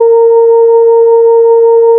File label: additive from voice single; FFTimbre